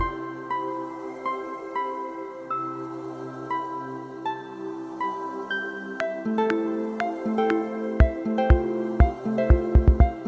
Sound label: Ambient Loop Room; MiSide Soundtrack